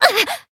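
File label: Voice damaged, Japanese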